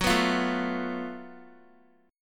F sharp Minor Major 7th Double Flat 5th, Guitar; F#mM7bb5 strummed; JGuitar

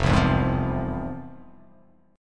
F Minor Major 7th, F

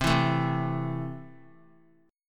C 6th C down, chord; C6 strummed

